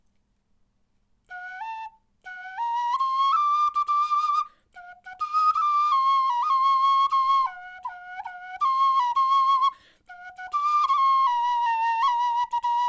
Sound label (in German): melodie, knochenfloeten